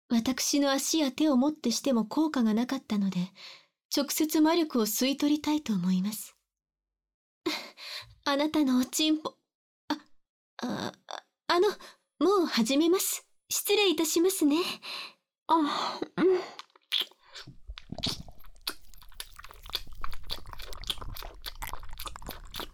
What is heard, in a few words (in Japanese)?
耳舐めされながらフェラ; 特典②19分間耳舐めされながらフェラされ、シスターと騎乗位で姦通おま◯こHし続ける音声